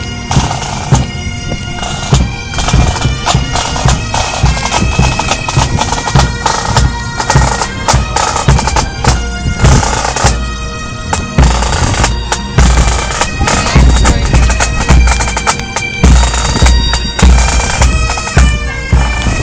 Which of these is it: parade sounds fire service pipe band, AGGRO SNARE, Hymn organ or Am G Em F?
parade sounds fire service pipe band